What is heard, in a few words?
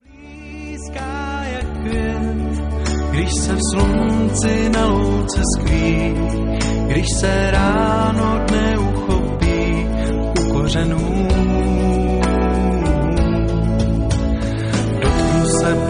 Blues